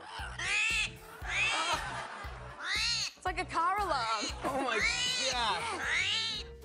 Screaming Hairy Armadillo; With Bobby Irwin

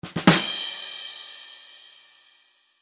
Comedy Drum Fill Accent; Mountain Audio; comics

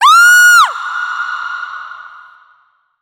voice distant scream; horror

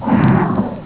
animal roar; animals

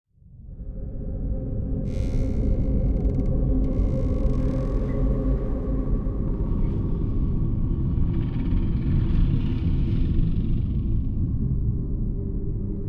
Creepy Ambience; horror; music

